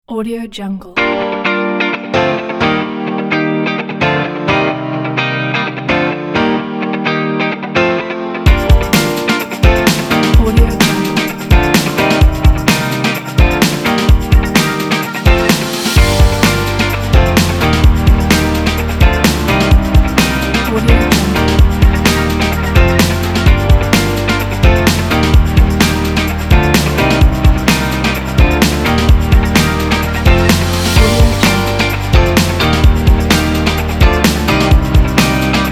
energetic fun summer pop

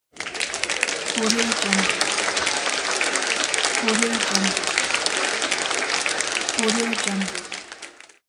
audience clapping

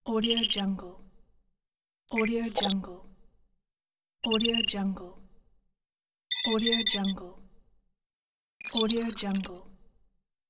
hitech computer beeping

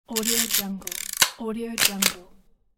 vintage camera shutter

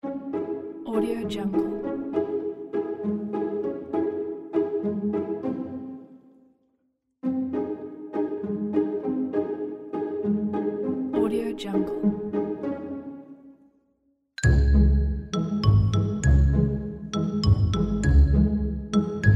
be funny

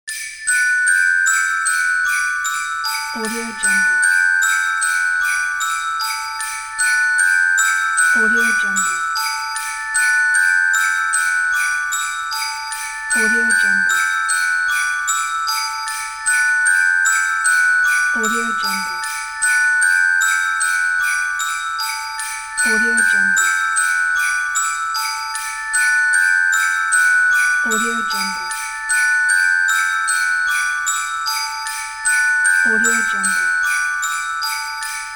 glockenspiel bell christmas background

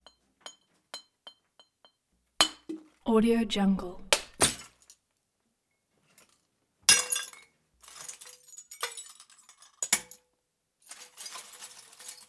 wine bottles smash glass debris shatter

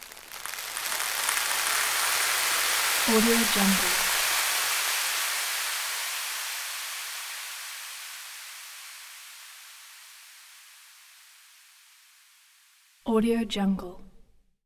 time drones air particles

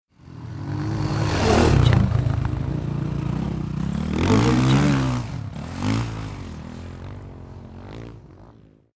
passing by motocross motorcycle

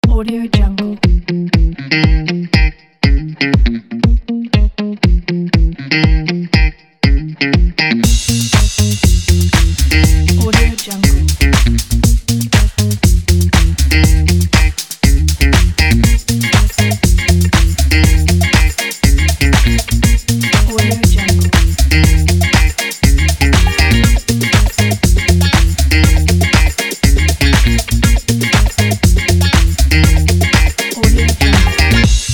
funk

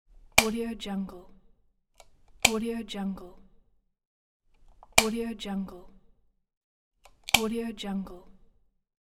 home lamp switch on and off sound

